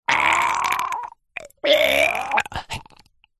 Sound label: human male strangle choke die vocal; zapsplat